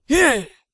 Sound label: attack, Zombies; zps; Vanessa